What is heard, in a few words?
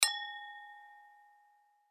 Wine Glass, Wine Glass Crystal Hit Ding Ring; Dishware; Household; Blastwave